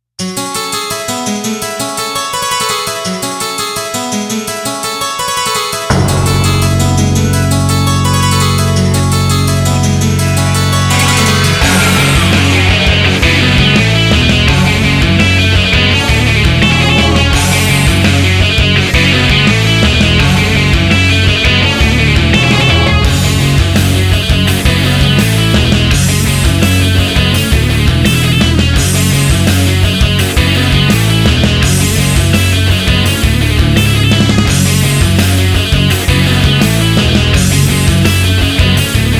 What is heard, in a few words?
Off Vocal 168 BPM